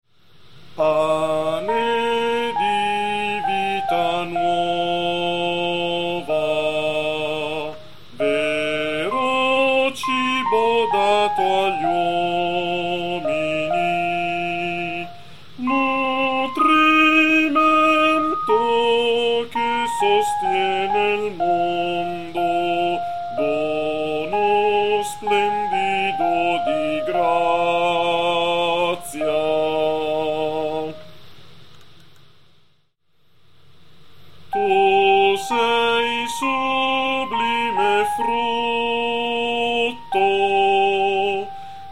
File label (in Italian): tenori